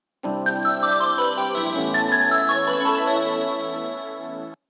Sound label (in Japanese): 由布院, 接近メロディのみ